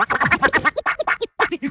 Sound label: Vocal Scratches 140BPM